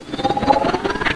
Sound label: pulsecannon spinup; svencoop; hl2